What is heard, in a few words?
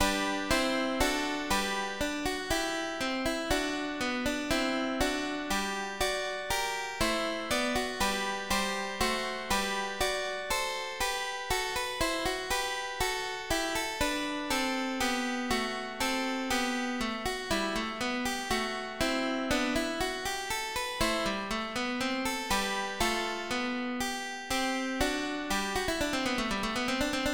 Prélude, MIDI